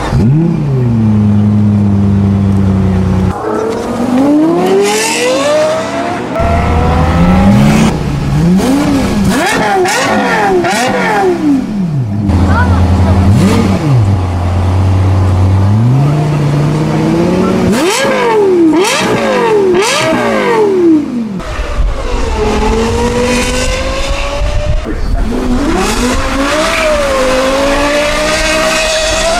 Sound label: Lexus LFA, car sound